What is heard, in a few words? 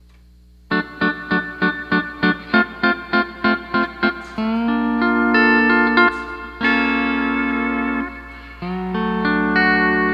Night Life E9th